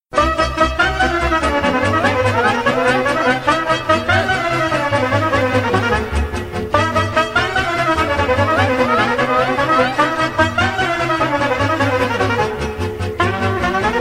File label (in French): Ardeleana, Roumanie